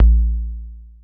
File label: TEC KICK, DRUMS; Music Store Sound Service; Einstein